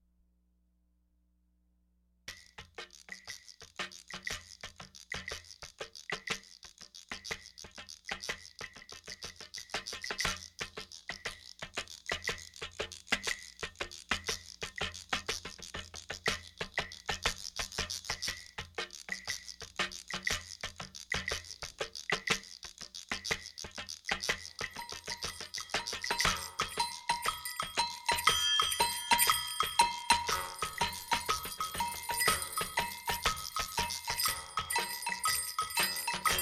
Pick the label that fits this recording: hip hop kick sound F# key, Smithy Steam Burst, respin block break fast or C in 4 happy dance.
C in 4 happy dance